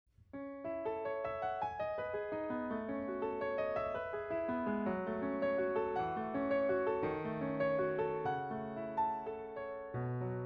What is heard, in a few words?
ivory II bosendorfer 290